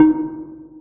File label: Radar; Okitoki Games; EasyTalk